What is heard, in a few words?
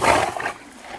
goat stand; free 2 play